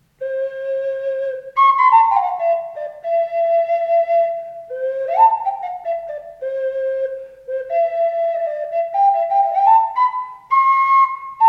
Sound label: song flute